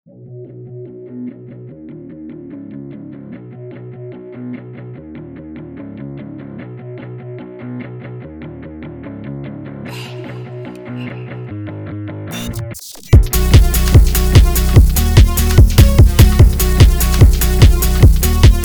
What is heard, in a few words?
default beat